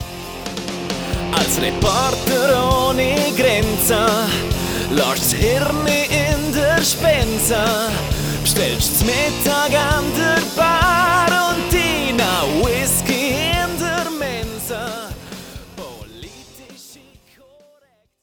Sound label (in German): gesang